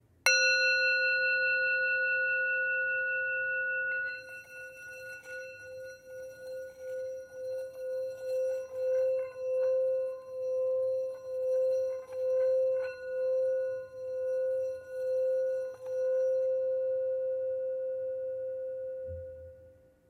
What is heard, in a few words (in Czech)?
tibetska misa